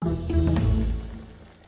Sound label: click